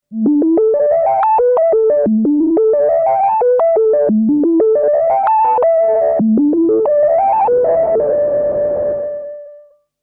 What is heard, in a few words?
Resonance 4 VCO Xmod Noise Mod